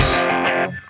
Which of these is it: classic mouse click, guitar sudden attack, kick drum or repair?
guitar sudden attack